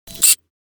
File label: Camera lock; shorts sound effects